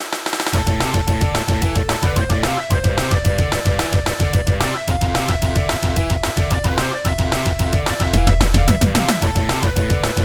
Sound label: music upbeat; galaxy hero android